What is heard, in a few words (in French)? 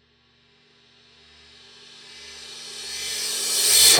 cymbale inversee